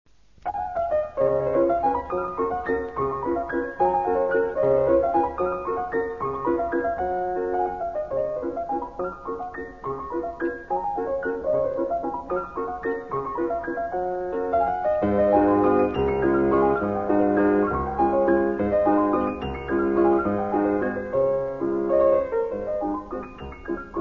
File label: Waltz; Chopin